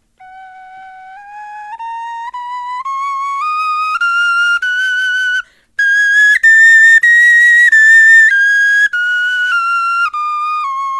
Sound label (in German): tonleiter